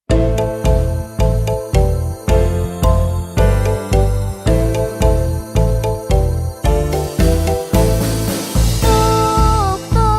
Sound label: Jump Rope Song; Squid Game 3